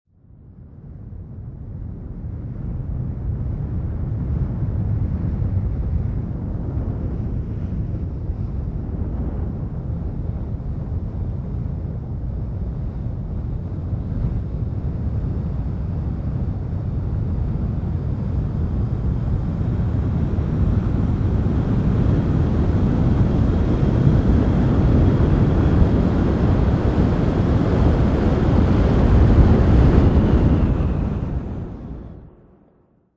Tornado, A tornado passes through with reverb and rumbling - Variation 2; Wind; Weather